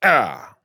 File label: Grunt Attack; paladins; Astro